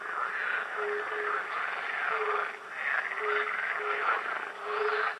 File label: Small ambient frogs; STALZONE